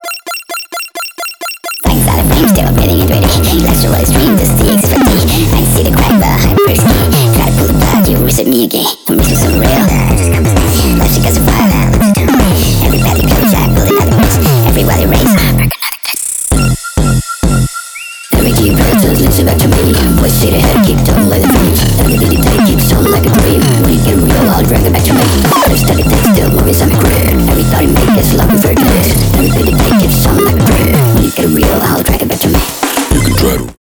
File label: pitched up